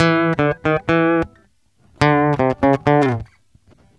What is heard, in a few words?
GUITAR SALSA